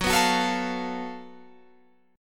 F sharp Minor Major 7th Double Flat 5th

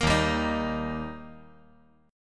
Eb Major Eb down 5G,4C,5E,5A; strummed; JGuitar

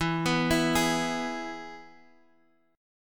E Major guitar chord, Half Step Down

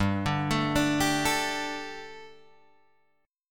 G Suspended 2nd, Guitar, Drop D; chordshape; down

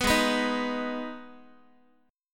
Ab Minor Ab, Guitar; strummed; 14,16,14,13,x,x; 4A,4E,5A,5D,5Gsharp,6Csharp

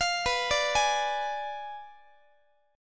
B Diminished 7th B down Guitar; arpeggiated; tuning 4A,4E,5A,5D,5G#,6C#

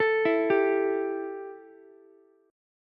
E Minor Double Flat 5th Ukulele; 14,16,15,x; arpeggiated; JGuitar

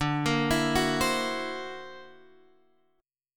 D Augmented 9th down Guitar Open G

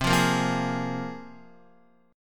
C 7th Suspended 4th Sharp 5th, Guitar

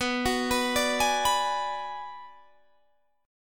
Gsharp Minor 7th Flat 5th

